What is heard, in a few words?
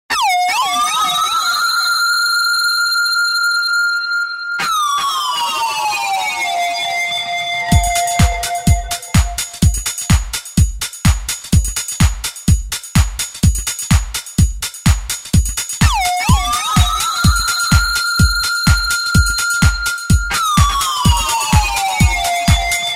electrofunk